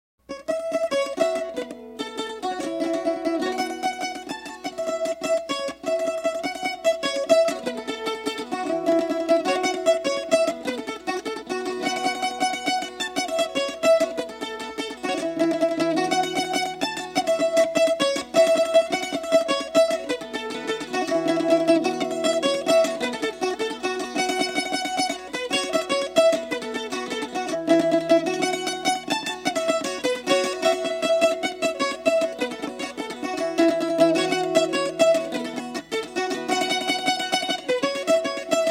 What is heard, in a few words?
mandolin improvisation